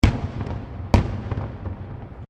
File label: Fireworks Multiple Explosions; Fireworks (Sound Effects:Backgrounds)